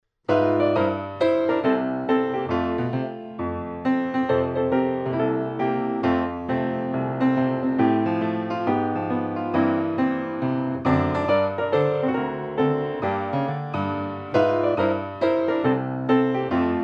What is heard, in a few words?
Piano